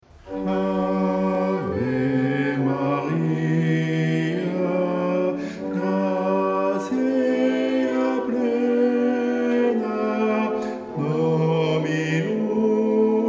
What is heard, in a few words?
Ave Maria Basses